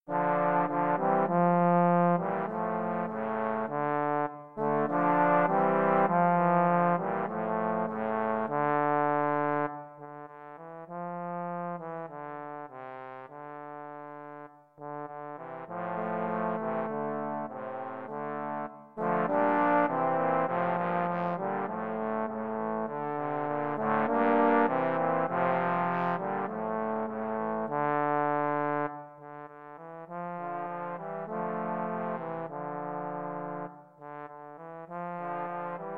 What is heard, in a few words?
trombone trio